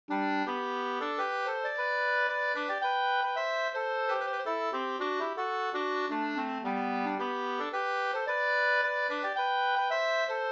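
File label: polska, two-part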